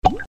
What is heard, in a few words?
electrical switch